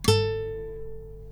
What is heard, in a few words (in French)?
La3 guitare